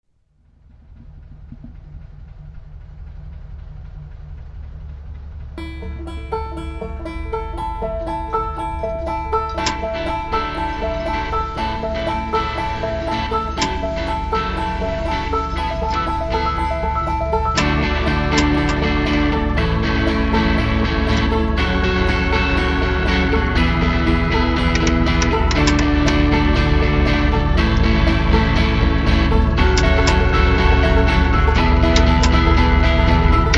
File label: V8 moturr